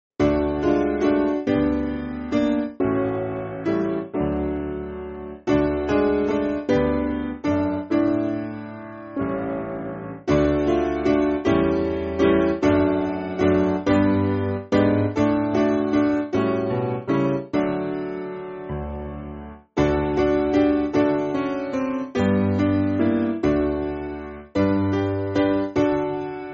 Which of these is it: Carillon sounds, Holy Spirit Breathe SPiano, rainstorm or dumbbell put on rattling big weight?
Holy Spirit Breathe SPiano